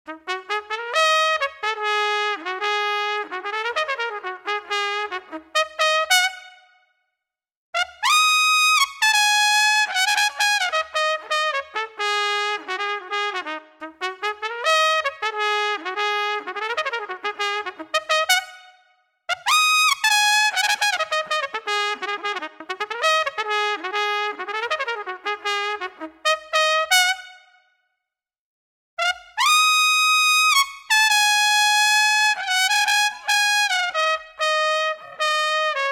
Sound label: Trumpet Tempo